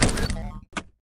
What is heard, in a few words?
Lock Picker Mount